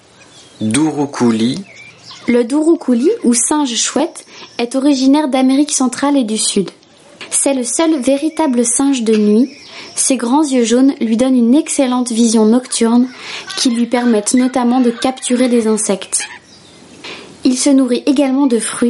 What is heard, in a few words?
douroucoulis